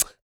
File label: FEM PERC, Vocal Jazz FX